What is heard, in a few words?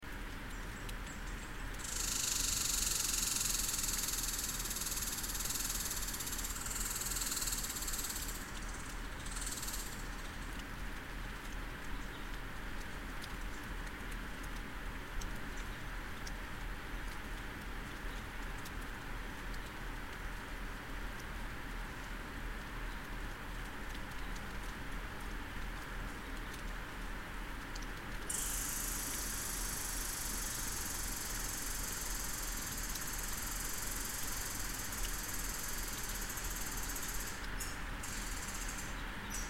red squirrel